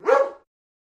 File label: Doberman Dog Bark Ind